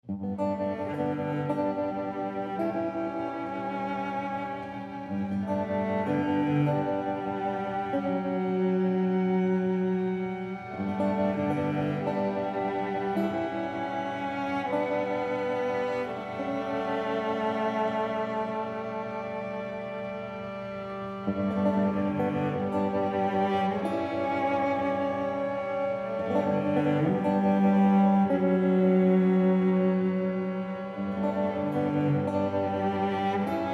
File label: Cello and Guitar, Guitar Oneshot; Giraffe